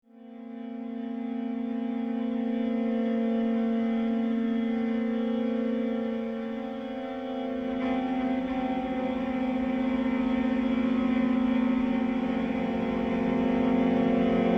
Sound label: spectral train